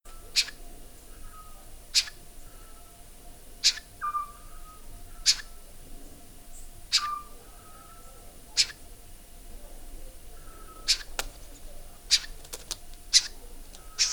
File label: call, otus scops; Microphone Sennheiser ME20 with parabolic Sony PBR330, Digital recorder Tascam DR-05.